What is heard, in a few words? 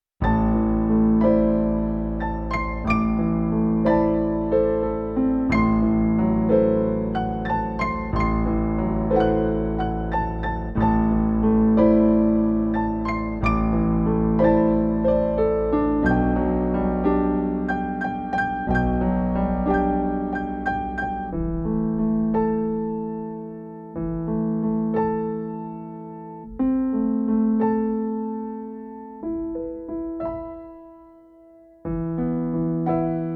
Dramatic Cue